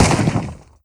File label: AGS17 end, weapons; DCS